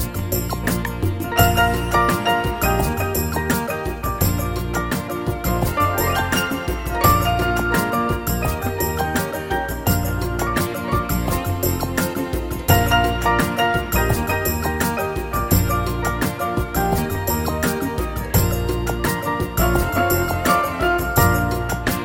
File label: Unplugged 16 Beat